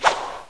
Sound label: Missile Trap; Death Blade